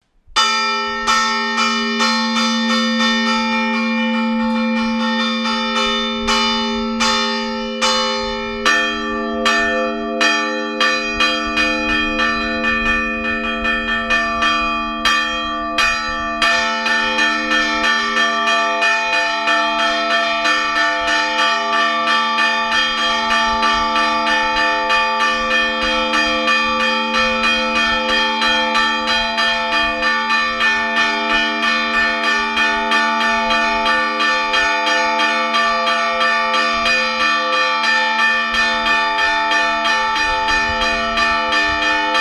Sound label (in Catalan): anunci de festa, toc repic, Es tocava repicant les 2 campanes petites (Petra-Sebastiana i Josepha). Primer lentament i accelerant de mica en mica; campanar